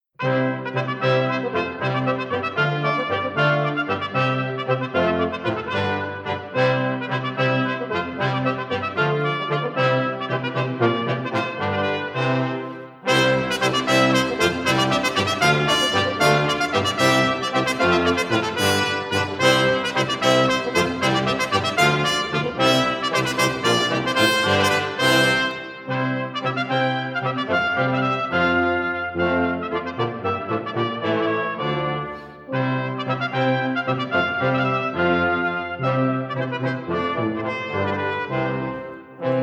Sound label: Basse Danse; Bergeret